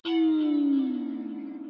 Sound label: Casse Feedback Music